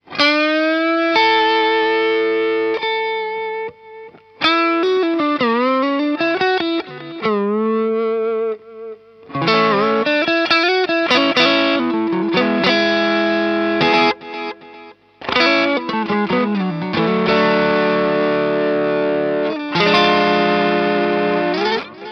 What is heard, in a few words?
dynamic delay strat; novadelay